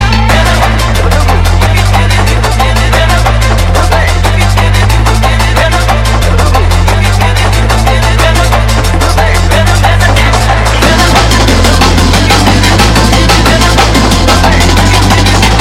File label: Drum and Bass Jungle, UK Bass Radio